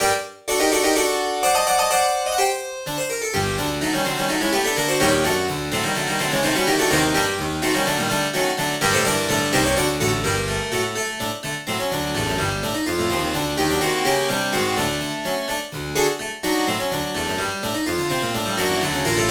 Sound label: harpsich